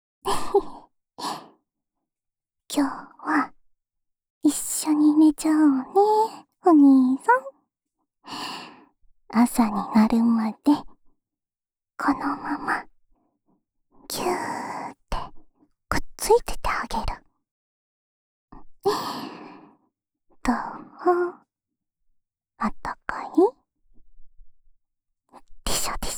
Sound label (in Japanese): 安眠用添い寝ループ（左耳）, ASMR